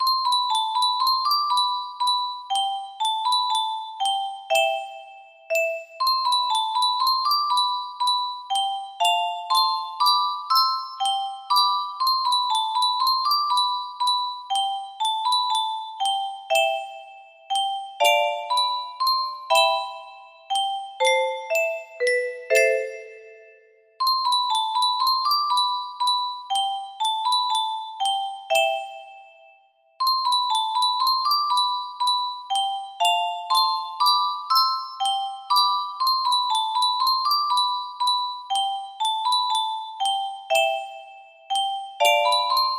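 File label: C major, music box melody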